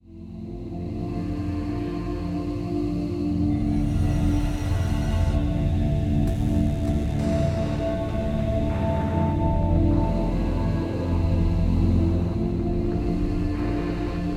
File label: sad swell; AirMech Command VR